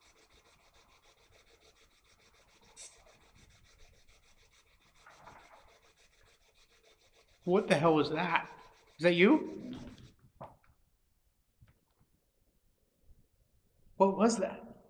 Balcony Spirit Box; Spirit Box 1; american renaissance church investigation

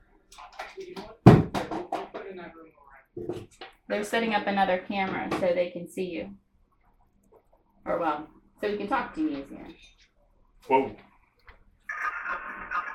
Basement Open Room Phasma Box

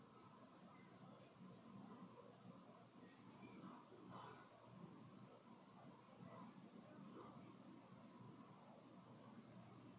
Empty Room

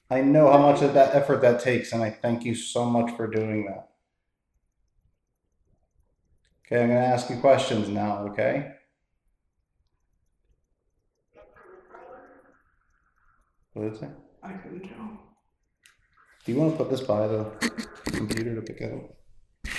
Servants Quarters Phasma Box

